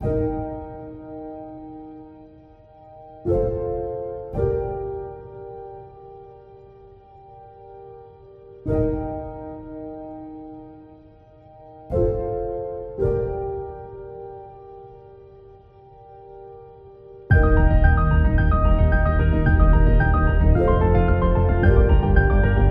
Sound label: Epic Motivation Beat